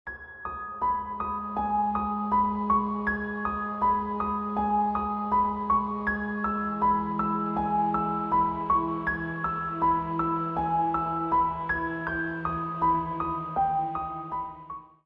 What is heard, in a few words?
dramatic scene separation melancholy; broadcast; demo version